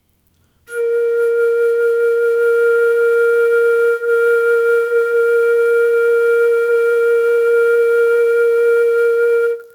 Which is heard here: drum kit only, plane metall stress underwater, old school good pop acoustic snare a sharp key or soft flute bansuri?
soft flute bansuri